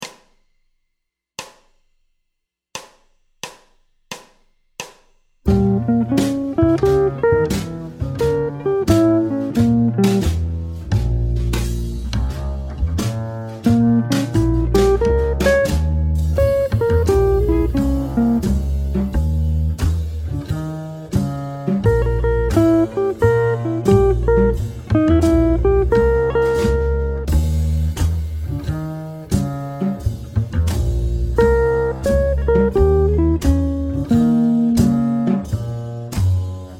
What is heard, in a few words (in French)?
Playback, guitare jazz